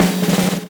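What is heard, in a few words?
drum roll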